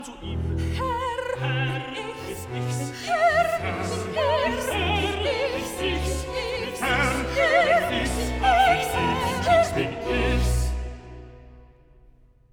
Chorus; Bach Matthew Passion; Herr bin ichs

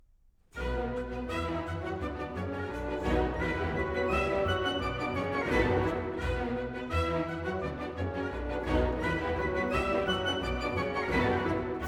Hungarian Dance in E minor; Brahms Violin Concerto & Hungarian Dances

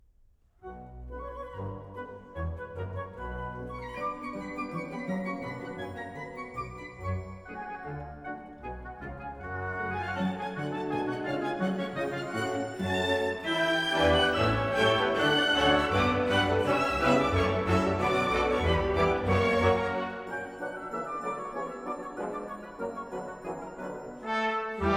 Hungarian Dance in B minor; Brahms Violin Concerto & Hungarian Dances

